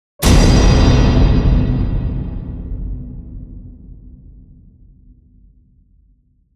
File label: Hit Metal; Ninja Tracks